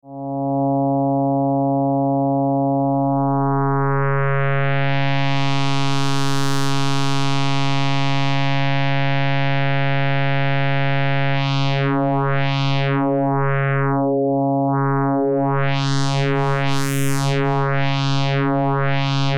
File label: synth C fm src